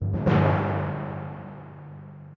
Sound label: Tymp